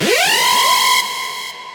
Robot Alarm; Robobeat

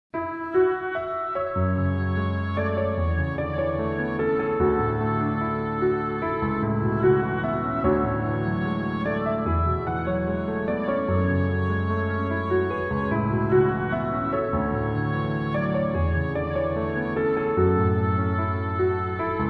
Hope (Piano Orchestra); Project Nimbus

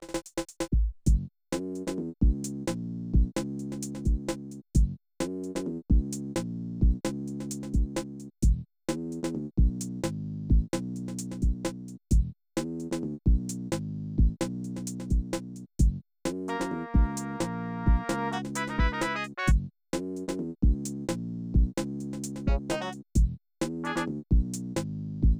Funk; doubutsu no mori n64 gamerip 2001

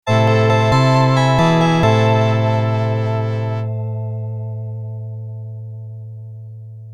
Sad Occasion; DOS

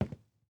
footsteps WOOD; PC Building Simulator

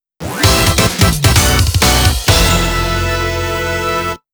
Wrong Tone; Natsuki Chronicles; Natsuki side

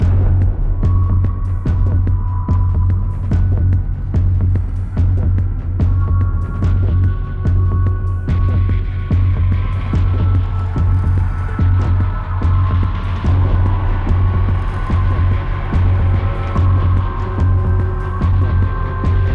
Combat Medium Threat Loop

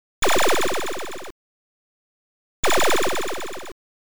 Self Shot; Oretachi Game Center Zoku; Time Pilot

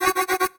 Alarm Siren Warning

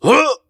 battle leap; Zote

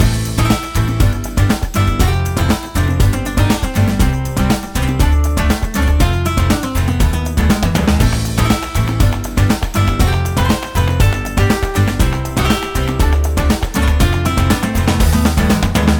BGM ramen

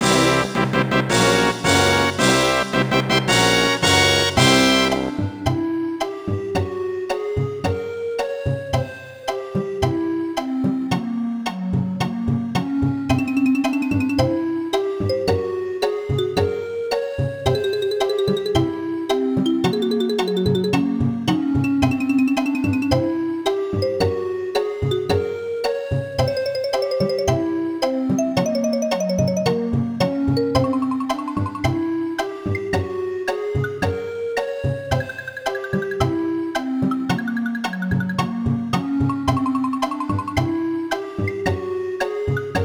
Secret Fanfare; Banjo-Kazooie

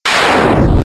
Fire Lash; pokemon reborn e19 2022

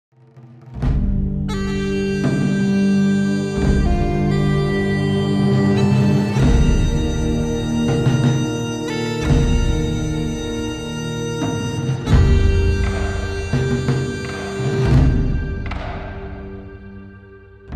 Commonwealth Defeat (alternate)

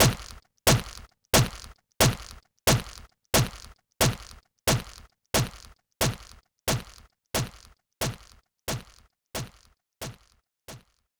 swords

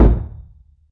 Drum; The Denpa Men; Android

